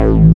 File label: SYN SAMPLE, Dance Synth; Sound & Vision - Gigapack I CD 2 (Roland)